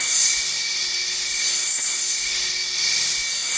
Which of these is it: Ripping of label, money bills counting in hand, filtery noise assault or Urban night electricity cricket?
filtery noise assault